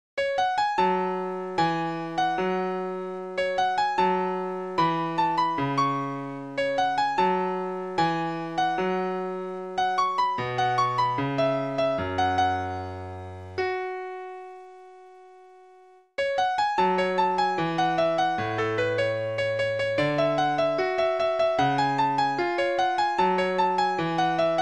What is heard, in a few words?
Piano; Naruto